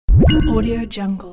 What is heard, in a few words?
blip magic